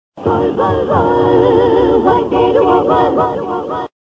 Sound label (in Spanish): gol jingle cierre